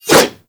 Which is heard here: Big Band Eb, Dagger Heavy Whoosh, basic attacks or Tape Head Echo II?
Dagger Heavy Whoosh